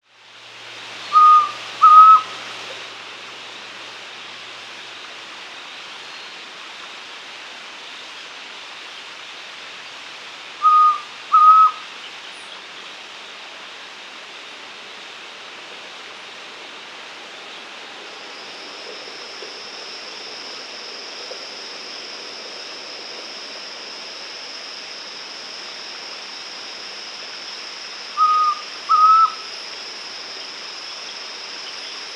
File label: White-Throated Antpitta (Grallaria Albigula), Andean Birds; S. America